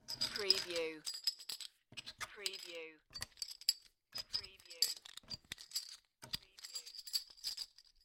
Beer Bottle Tops; beanstalk audio

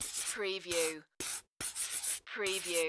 Marker Write On Card

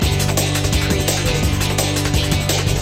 surf loop

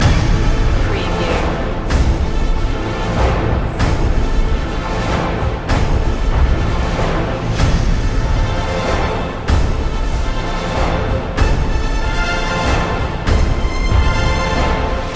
hit hard loop

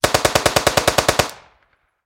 Submachine Gun Sterling

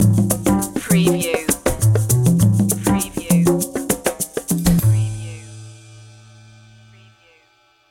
jazzy opener

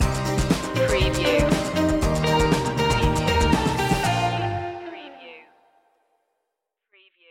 full sails stinger

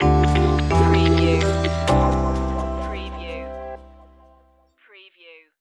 logo

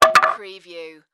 Wood Drop 2x4; Sound Effects Bible

